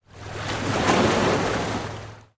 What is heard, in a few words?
splash small; themepark; rides; splash mountain